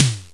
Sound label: electric snare; Oberheim drum sound chips